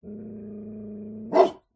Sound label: animals dog medium sized growl into bark med close